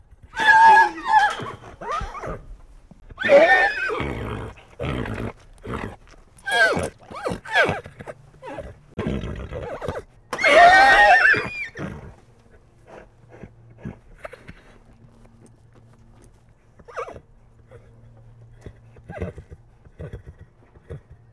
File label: Horse Vocals Short